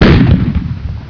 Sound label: fire 30mm